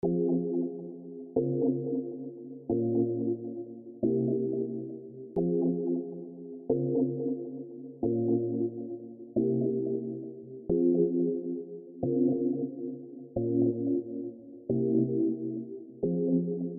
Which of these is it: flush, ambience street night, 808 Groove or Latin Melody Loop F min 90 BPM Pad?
Latin Melody Loop F min 90 BPM Pad